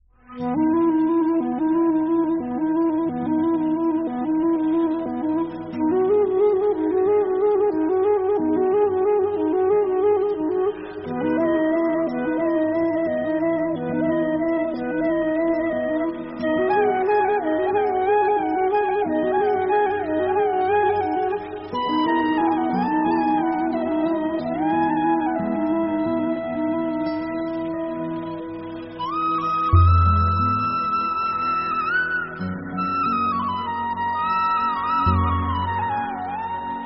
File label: suling, krishna flute